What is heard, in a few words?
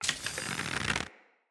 Bow Charge; Weapons; Josalfar Bow